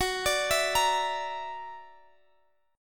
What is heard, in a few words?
Gb Augmented 7th, down, Guitar, Half Step Down